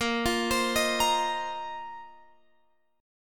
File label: Bb Suspended 2nd Suspended 4th Bb down Guitar Open F; Bbsus2sus4 arpeggiated